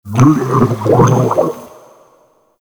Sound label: Inked Humanoid Large Attack; Enemies; Warframe; Shadowgrapher